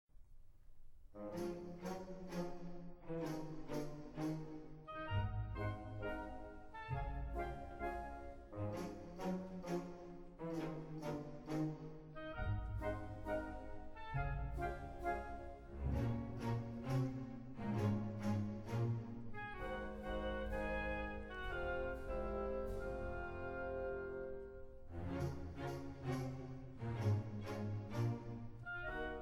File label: polka; Offenbach